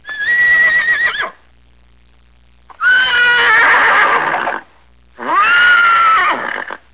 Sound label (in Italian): cavallo